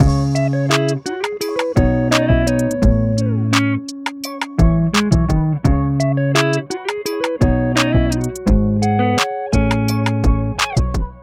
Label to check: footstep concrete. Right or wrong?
Wrong